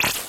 JV KIT; Pro-Rec - Synthsonic; JV ANALOG1

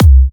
Kicks C; markus hakala

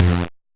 Noise, 8-bit, Bitty Noises

